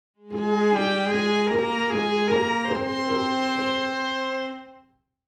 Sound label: Video game success victory win pizzicato